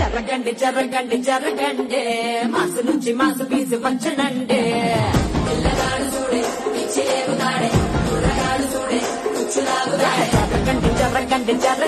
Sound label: Ringtone